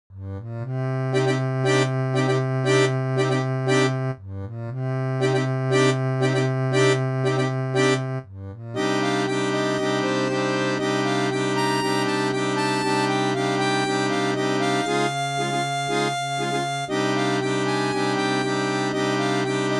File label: Synthesized audio for study; harmonica; arr Doucette